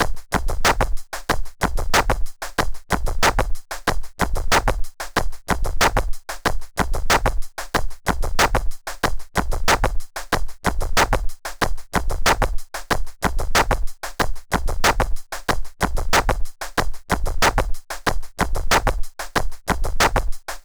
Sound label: DSP, Drum Loops, Distorted; Zero-G Smoke